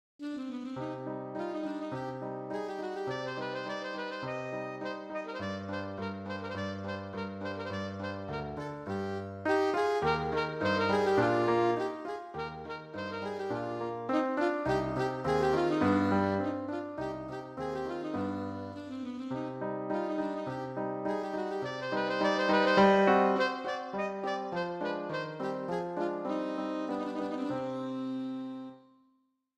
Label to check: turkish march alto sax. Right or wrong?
Right